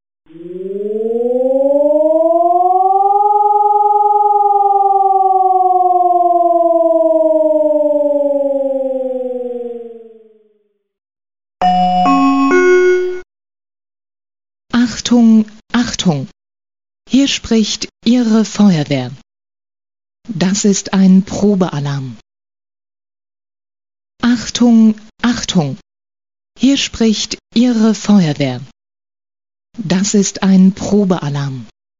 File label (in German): Probealarm